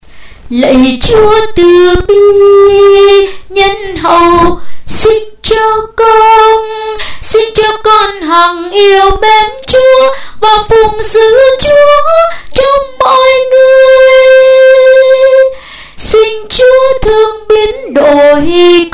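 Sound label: soprano tenor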